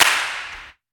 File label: roomy clap sound a sharp key, Royality free hand clap tuned to the A# note